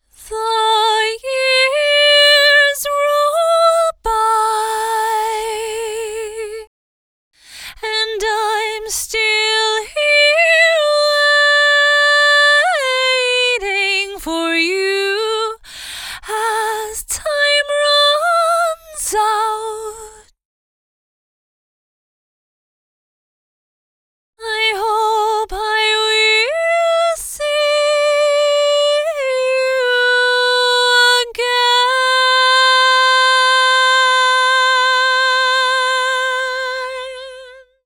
Top Line Vocal - Fm 60Bpm; Construction Kits; VOCALS; Ghosthack; SkyRealm; Kit 04 - High Rock View

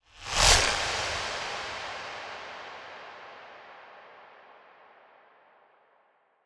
Impact

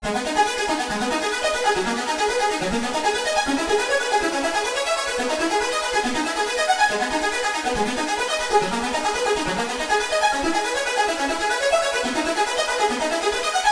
Arp Loop - 140 BPM G# Min, Synths; Loops; Cymatics; EDM Starter Pack

